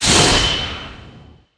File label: aura loop